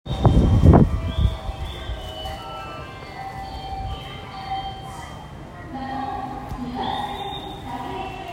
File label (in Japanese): 線仙川東接近, train sounds; 京王